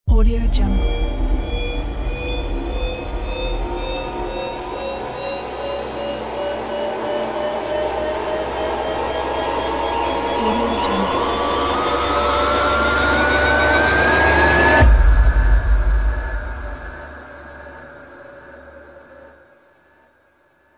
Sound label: engine drama rise